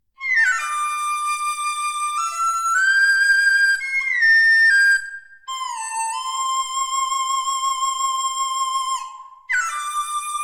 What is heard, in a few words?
Dizi